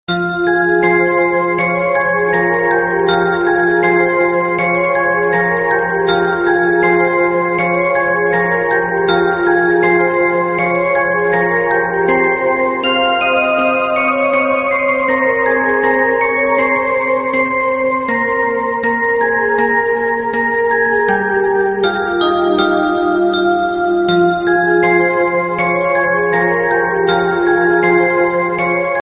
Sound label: love bells ringtone